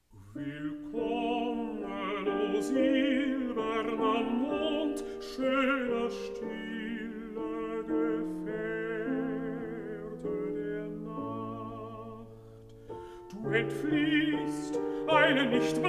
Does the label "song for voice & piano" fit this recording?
Yes